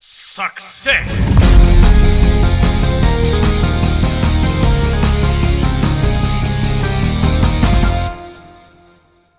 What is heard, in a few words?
soundtrack; Typical Colors 2